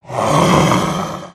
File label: Dragon Mouth Open